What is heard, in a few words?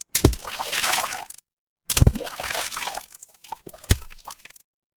water splash